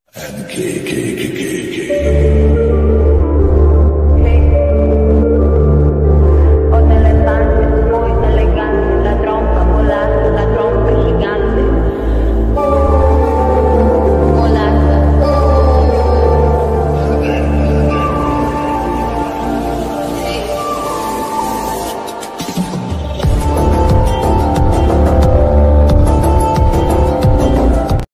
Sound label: attitude ringtone